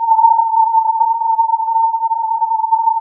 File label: filtered input